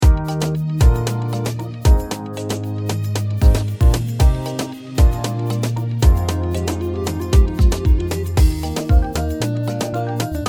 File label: afro dance